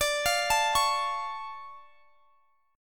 D Minor Major 7th D, guitar; arpeggiated

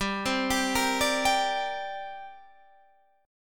C 7th Suspended 2nd

